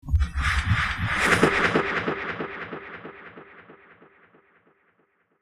Transition

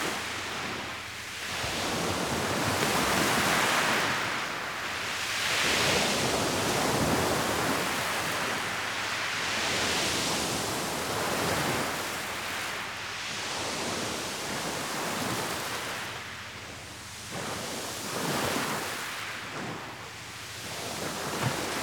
Mono Beach Waves Pebbles

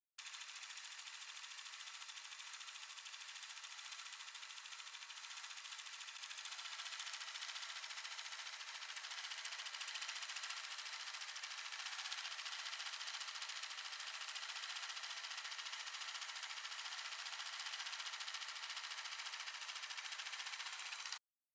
Sprinkler

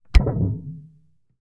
Plastic Wobbling

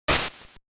Crash

